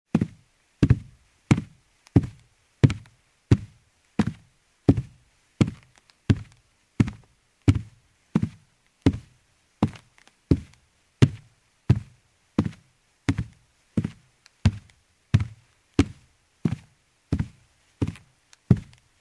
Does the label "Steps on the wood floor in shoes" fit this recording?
Yes